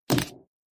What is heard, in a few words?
symble fast stop